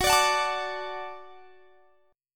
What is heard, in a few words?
Gb Minor Sharp 5th; Gbm#5; strummed; x,21,24,23,22,22